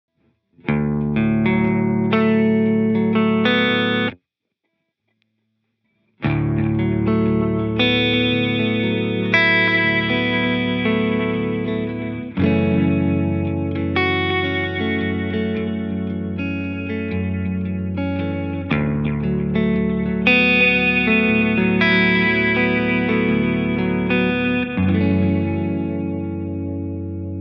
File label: Analog Deluxe Memory Boy